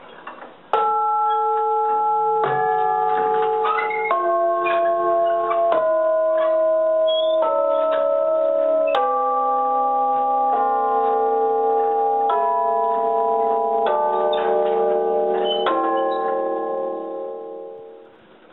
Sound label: Tubular Bells; Riddings